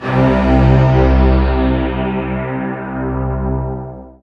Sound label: SYN K-2000, Roland